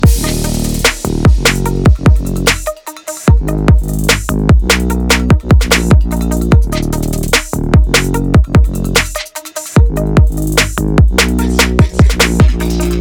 urban hip hop

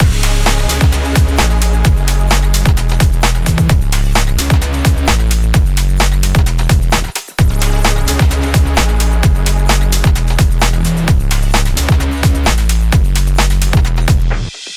sport rush loop

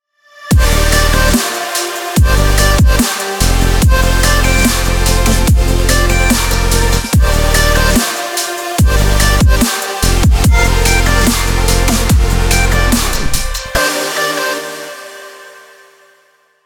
extreme energy burst

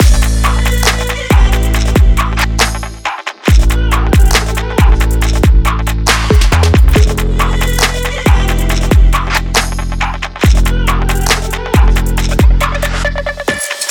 dynamic sport action trap loop

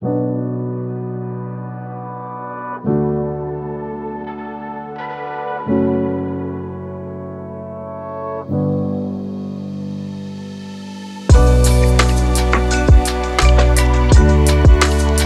inspirational travel timelapse

